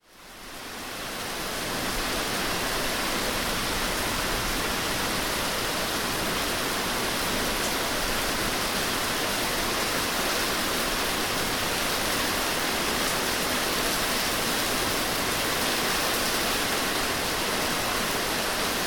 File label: rain, nature